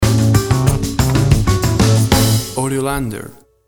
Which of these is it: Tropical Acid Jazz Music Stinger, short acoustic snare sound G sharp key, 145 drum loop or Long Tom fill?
Tropical Acid Jazz Music Stinger